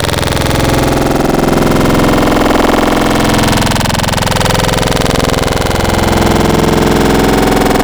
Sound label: Robot Vomit, Wavetables; Cymatics; Wavetables Collection Vol.1; Serum